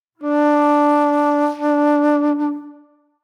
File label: Mellow Flute